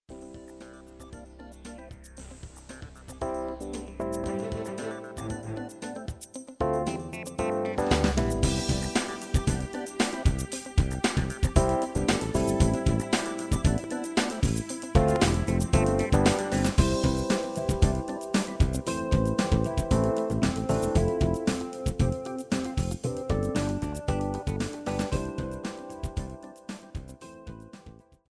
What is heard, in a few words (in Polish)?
wersja instrumentalna